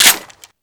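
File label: weapon foley m1887 reload close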